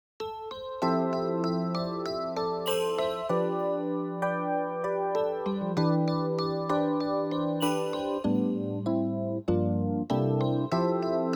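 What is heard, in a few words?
Serious loop; Chiru; Gal Gun 2; VRGG BGM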